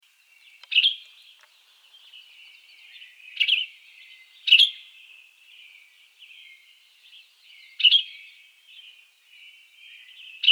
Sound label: Western Tanager Piranga ludoviciana